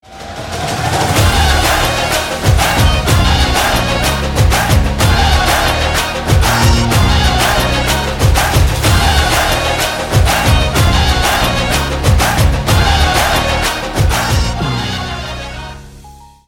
Dhol Mix Instrumental

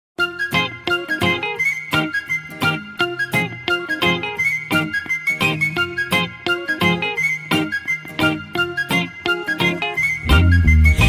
Instrumental Tone

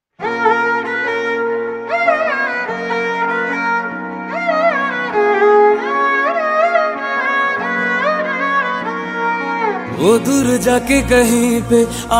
Instrumental

